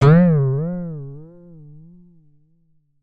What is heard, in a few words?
comedy spring twang pluck; sound effects one